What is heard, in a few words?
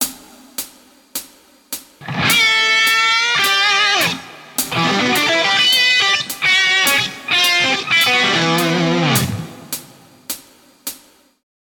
Lickbag, Guitar; Guitar Techniques 2019 Issue 290 January